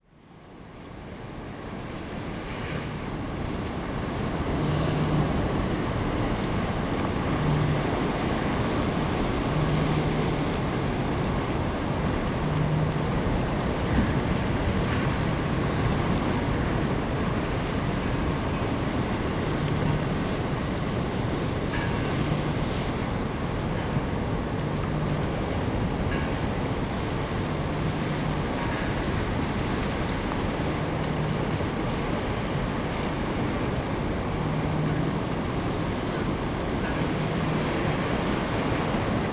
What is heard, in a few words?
Ucluelet Lighthouse Crashing Waves